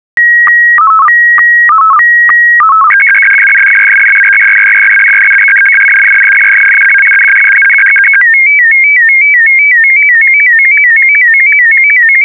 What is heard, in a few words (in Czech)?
AVT90 synchronizace, SSTV Signals; hamradio